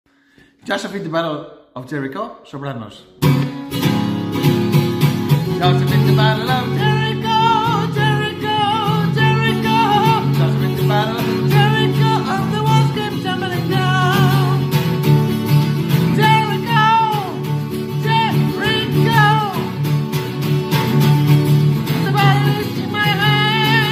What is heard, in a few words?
joshua fit the battle of jericho soprano